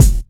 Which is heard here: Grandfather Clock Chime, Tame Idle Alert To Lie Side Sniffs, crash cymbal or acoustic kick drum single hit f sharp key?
acoustic kick drum single hit f sharp key